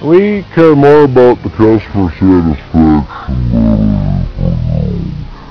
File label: die; svencoop; cracklife; agrunt